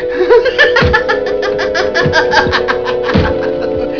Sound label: laugh; fifthelement